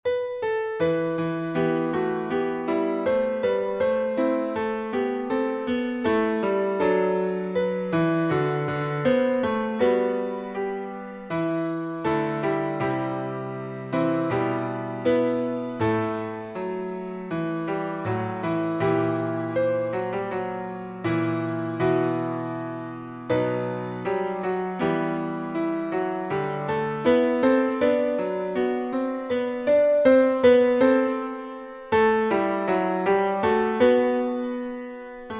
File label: tutti